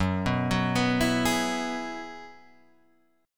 F sharp 7th Suspended 2nd Suspended 4th, down; F#7sus2sus4 arpeggiated

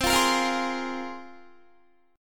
C 7th Suspended 4th Sharp 5th, Guitar; C7sus4#5 strummed

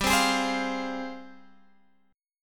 F Minor G, chord; strummed

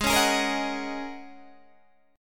G sharp 9th Suspended 4th, Guitar, Open D

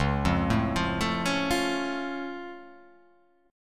C sharp Augmented down, guitar chord

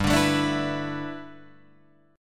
G 6th Add 9th G Guitar Drop D, chord

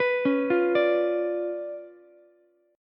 C sharp 7th Flat 9th, Ukulele, C6; arpeggiated; chord; 16,13,13,17

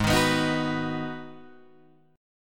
G sharp Minor 7th G sharp, Guitar Open D

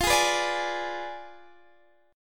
F Diminished 7th Guitar Open D, chord

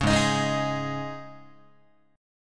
Ab Suspended 4th down Guitar; Absus4 strummed; 4,4,6,6,7,7; JGuitar

